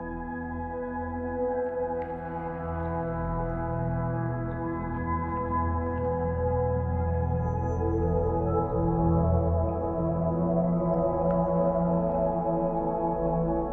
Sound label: prelude loop; roguebook